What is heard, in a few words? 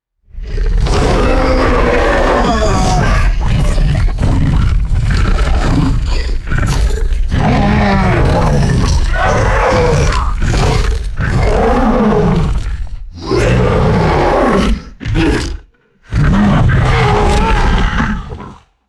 multiple large creatures growling and grunting